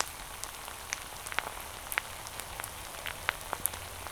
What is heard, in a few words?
SIZZLE